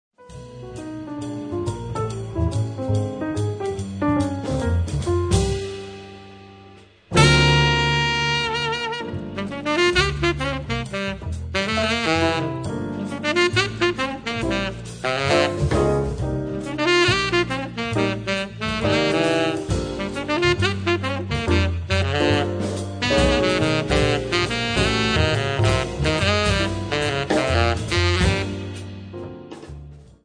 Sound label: Blues; Cold Spring Jazz Quartet